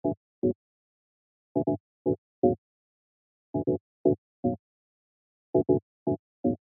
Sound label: organ